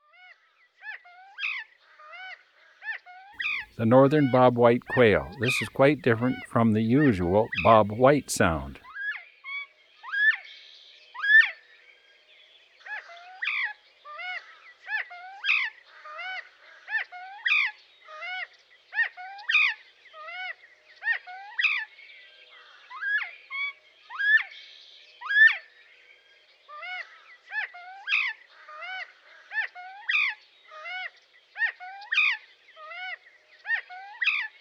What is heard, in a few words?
Northern Bobwhite Quail; Eastern North America; Dan Gibson; Wildlife Identification By Sound